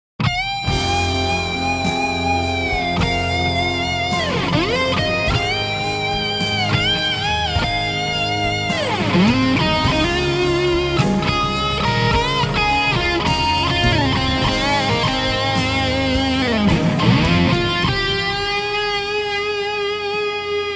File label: solo Strat Muff; EMG